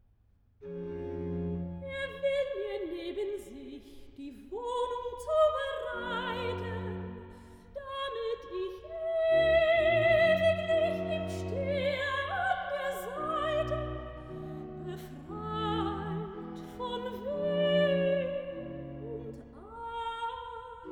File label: Recitative (soprano); Johann Sebastian Bach; Gott faehret auf mit Jauchzen BWV43; Archiv